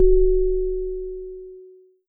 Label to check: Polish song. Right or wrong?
Wrong